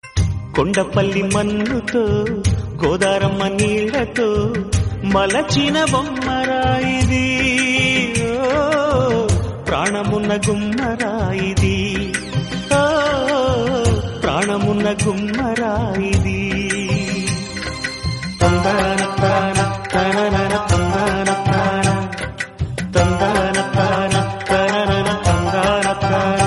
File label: old melody telugu; ringtone